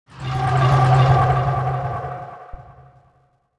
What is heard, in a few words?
roar